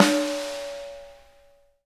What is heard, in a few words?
SNARES, EDG, Drum Kits